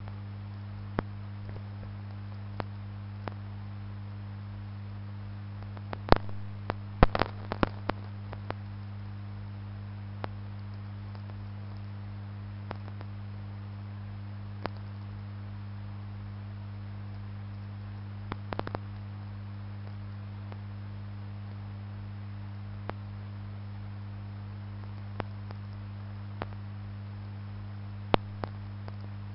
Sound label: vlf; uganda